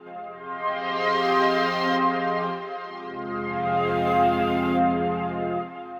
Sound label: SYNTH PAD ETHNO 160 C, Sphere